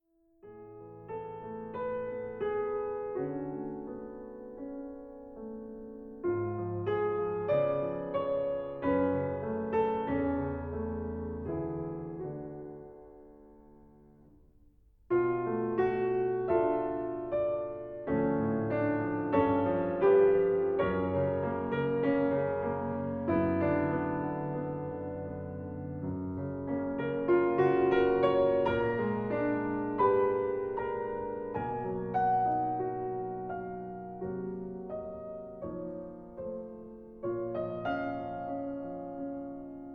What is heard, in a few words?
piano